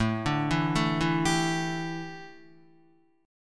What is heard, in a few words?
A 7th Suspended 4th, A, down, Guitar; A7sus4 arpeggiated